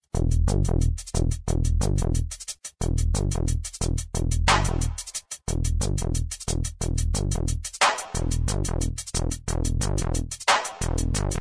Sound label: dnb